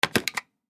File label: Stamp Self Inking Small Press Down; Stamp Self Inking Small Press Down Clicks; Office; Blastwave